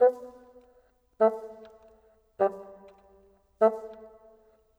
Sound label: Bassoon, Orchestral; Sonic Foundry (Sony Creative Software); Orchestral VOL-2; Modern 02